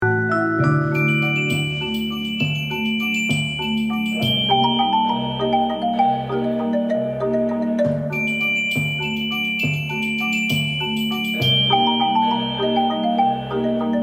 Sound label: danse macabre instrumental; saenss danse macabre bbc instrumental sessions